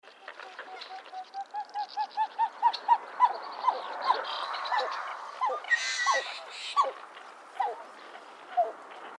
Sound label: GREBE PIED BILLED; species/birds; wbba